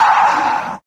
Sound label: "ah" exhale; Voice; ooh ahh